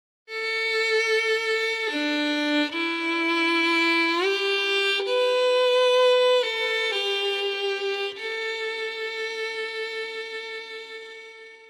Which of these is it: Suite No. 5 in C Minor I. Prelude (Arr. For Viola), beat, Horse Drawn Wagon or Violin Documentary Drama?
Violin Documentary Drama